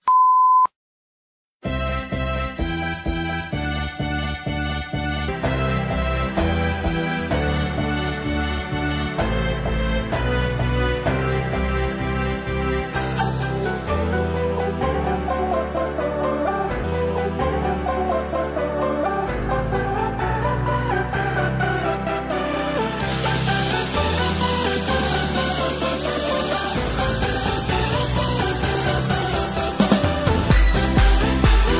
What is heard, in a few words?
summer gymnastics floor music